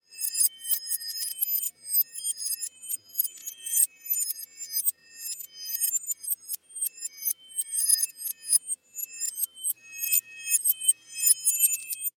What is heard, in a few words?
sound design matrix style reversed twinkle short